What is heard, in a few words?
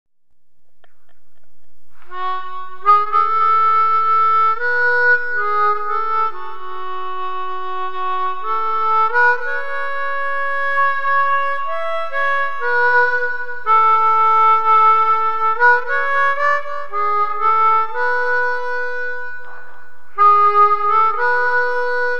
instrumental; Els Segadors